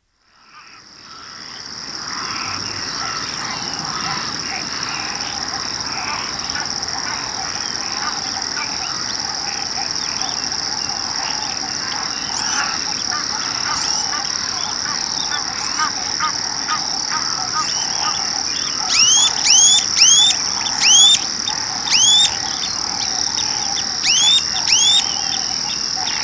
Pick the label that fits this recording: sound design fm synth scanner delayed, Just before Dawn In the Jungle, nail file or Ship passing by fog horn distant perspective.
Just before Dawn In the Jungle